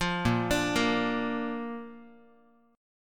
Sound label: A sharp Major, Guitar; arpeggiated; JGuitar